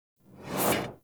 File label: Chop Entrance Axe Whoosh; Sinecure Chop Entrance